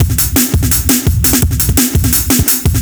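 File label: Fatbottom Beat, Drum N Bass; Sonic Foundry (Sony Creative Software); Knutrix Drum & Bass; Beats 2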